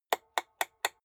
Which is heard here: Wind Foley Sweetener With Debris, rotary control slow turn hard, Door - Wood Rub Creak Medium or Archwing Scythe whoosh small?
rotary control slow turn hard